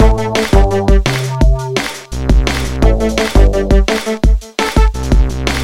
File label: Junglist Loop